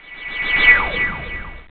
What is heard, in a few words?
info snd; Tesserae Demo